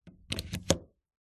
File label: household electrical plug phone charger plug in wall socket